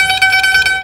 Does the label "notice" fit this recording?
No